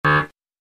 wrong buzzer; gta ctw

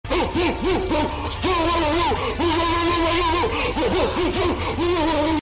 barking; tyler1

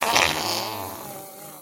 juicy warm wet fart

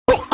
annoying book noise

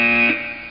different wrong buzzer

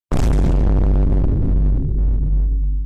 vine boom sound effect bass boosted

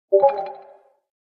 halloween notification; discord

